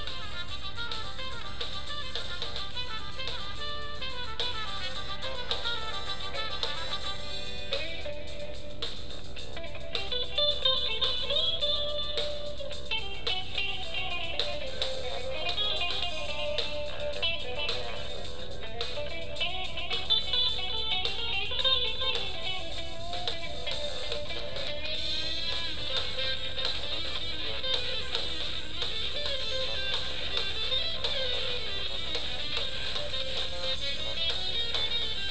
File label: audio musikala